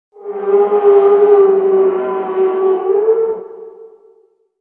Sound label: Shroomie Lift Up